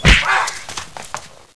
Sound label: punch; XMEN2